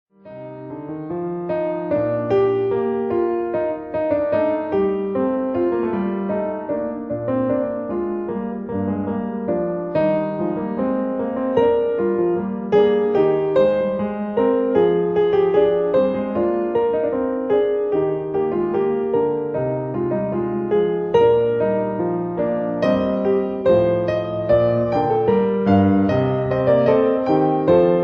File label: E flat major Fugue; The Well-Tempered Clavier, Book II